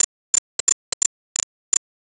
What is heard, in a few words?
buffer fuct loops